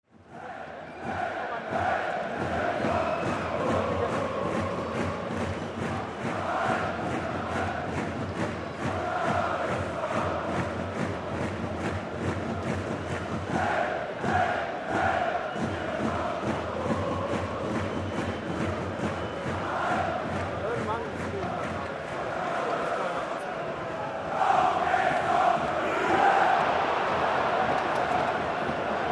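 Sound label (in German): Hamburg hören Stadion Volkspark; hoerreise; Hafenrundfahrt; St. Pauli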